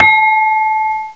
BELL, BELLS; CMI Sound Library Rev 1.3

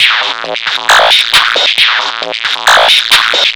Drums Strange

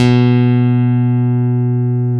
BS CHAPST, Chapman Stick; Roland L-CD701

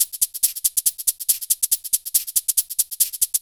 Loops 140 BPM Hihat Percussion Loops

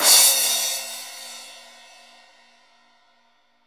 CRASH 2 R, DRUMKIT

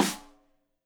Rim Shot Stereo; Drum; Deeply Sampled Drums; SMDrum

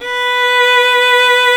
VIOLIN B4, SOLO STRINGS; Greytsounds VOL-1

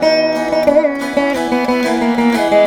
VEENA; Spectrasonics; Heart of Asia

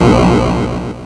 Atari Noise Long, Atari 2600

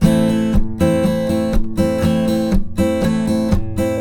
Blues Guitar Samples, RIFF; ILIO; Fretworks

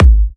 G#, kicks; markus hakala kicks

